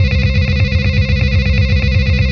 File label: bleep; ambience